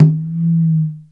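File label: Conga, Roland S50 Percussion; Drum Samples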